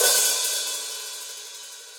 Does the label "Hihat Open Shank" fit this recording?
Yes